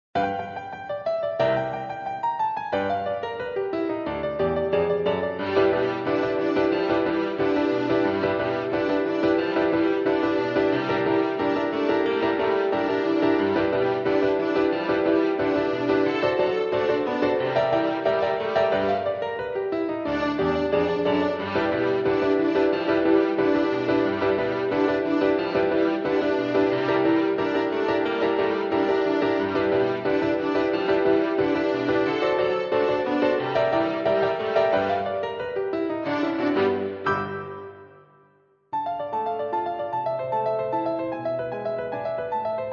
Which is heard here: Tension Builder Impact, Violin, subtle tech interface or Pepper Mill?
Violin